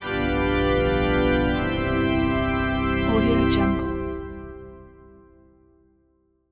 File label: music cathedral organ; prosoundfx